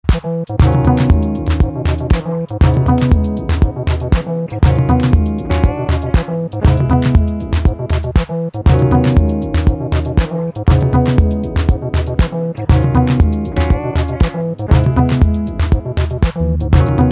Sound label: Smiling Guitar